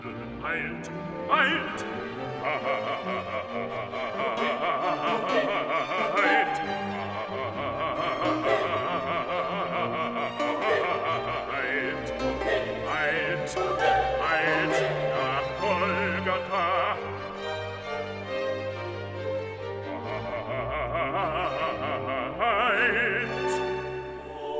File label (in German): TENOR, Stimmen mit Klavier; Johannes Passion